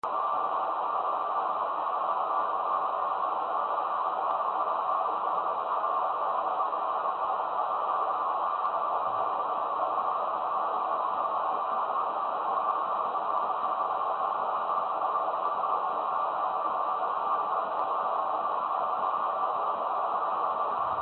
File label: Cast Iron Leak